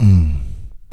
"MMMM", Male Vox; E-MU Formula 4000 Series Vol. 1; Hip Hop Nation

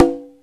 CONGA SET; OMI - Universe of Sounds

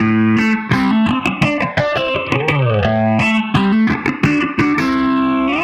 Guitar, 85bpm, A, analogue circuit; musicradar

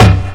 techno, percussion techno; Ueberschall; Techno Trance Essentials; DRUMS

